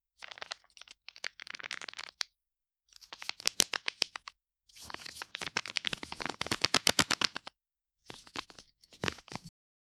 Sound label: card shuffle multiple